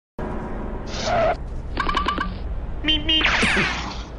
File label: migmig, beep beep; road runner; cartoons